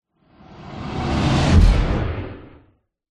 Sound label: sound design whoosh heavy hard fast impact